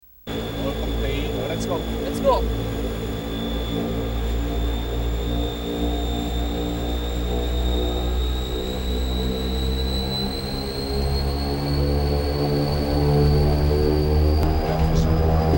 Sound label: YS-11